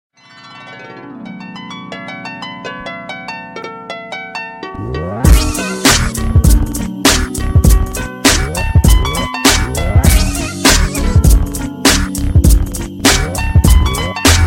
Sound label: dance pop